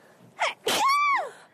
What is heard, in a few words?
Achoo